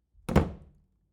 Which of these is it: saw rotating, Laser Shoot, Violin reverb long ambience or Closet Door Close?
Closet Door Close